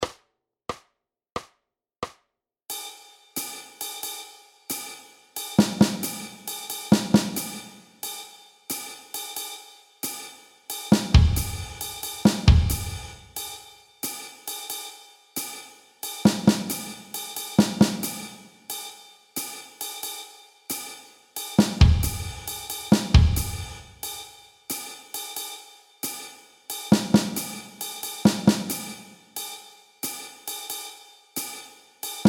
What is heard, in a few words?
jazz Drum Warm Up; Intermediate Drum Lesson